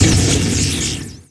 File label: Magic